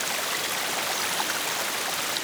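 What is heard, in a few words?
environmental, Drum N Bass; Sonic Foundry (Sony Creative Software); Knutrix Drum & Bass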